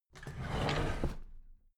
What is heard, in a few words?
drawer big squeak open heavy